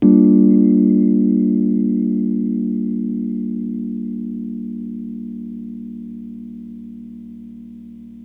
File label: GM FLAT7, Chords Hits; E-MU Producer Series Vol. 8 – Platinum Phatt; EP Chords Hits